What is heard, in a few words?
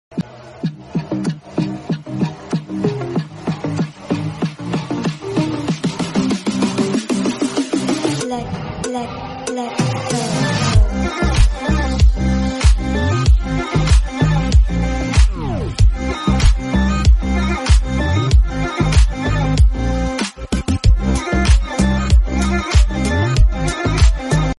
cool arabic background music tone